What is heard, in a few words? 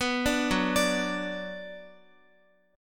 Gb Suspended 4th Sharp 5th Gb, down, Guitar; arpeggiated